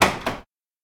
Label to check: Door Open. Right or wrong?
Right